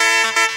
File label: Clav - 8A, Jungle; Riffs, Arps & Hits; Blu Mar Ten - Jungle Jungle - 1989 to 1999 Samplepack